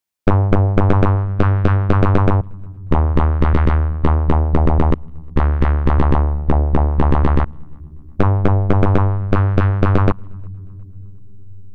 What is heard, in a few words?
gate 16 steps bubble; the meditation soundset